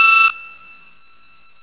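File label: burglar alarm; Zomboid 41.78.16; Project Zomboid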